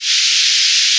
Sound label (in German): zisch 8000